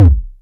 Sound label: kick, Korg ER-1; 300 Drum Machines; Kit 11